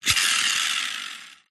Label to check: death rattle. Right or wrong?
Wrong